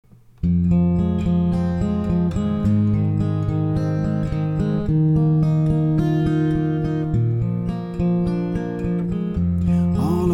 Solo Acoustic; English/Jamaican solo acoustic musician

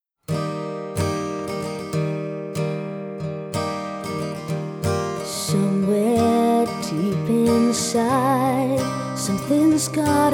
acoustic

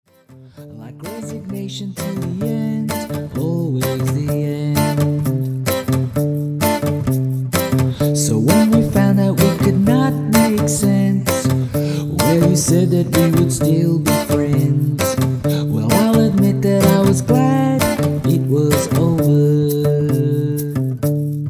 acoustic

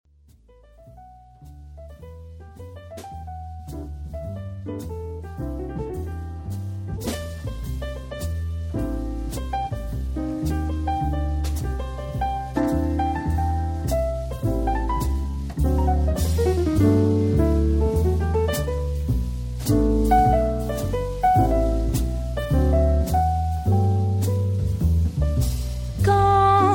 Jazz Band

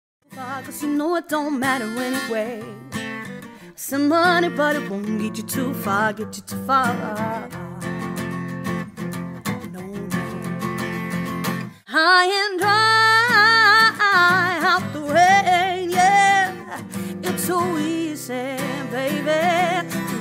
Acoustic Duo Cover

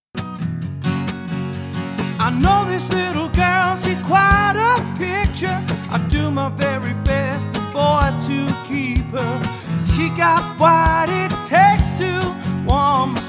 Solo Acoustic; Guitar Vocal

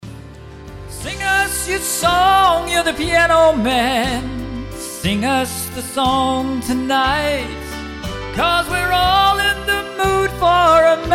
Piano

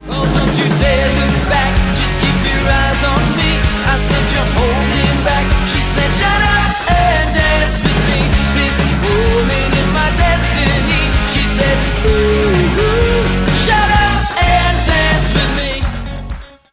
Corporate Party Cover Band

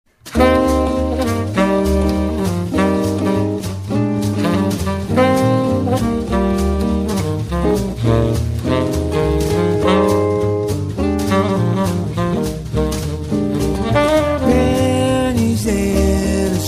trio

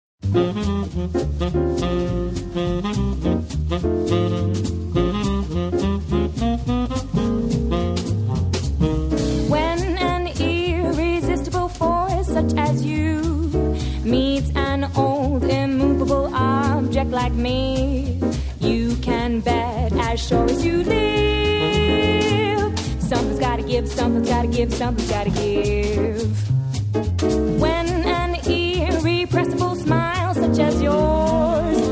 Jazz Band

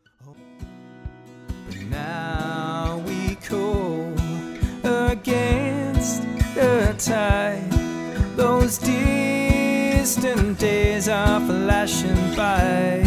Solo Acoustic

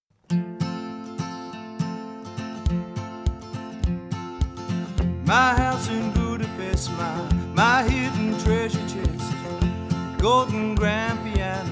Solo Acoustic, Singer Guitar Player; Guitar Vocal

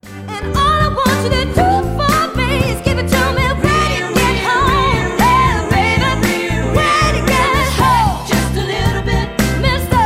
Corporate Party Cover Band

